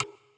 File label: glitchtile; gonner